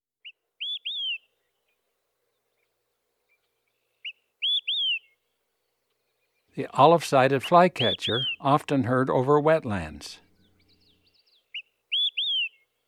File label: olive sided flycatcher; dan gibsons solitudes